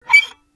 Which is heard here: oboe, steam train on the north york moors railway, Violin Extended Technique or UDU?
Violin Extended Technique